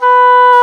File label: Oboe D'amore; Roland L-CDX-03 Disk 1; Oboe 4-8